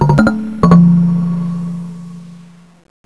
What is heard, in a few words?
WOODBLOK; MUSICAL